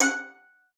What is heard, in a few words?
F#5, VIOLIN SPICC; Xsample VOL-5